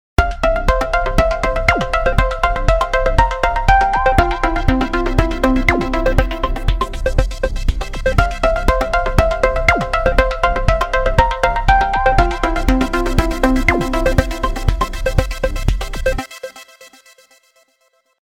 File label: Air, ringtones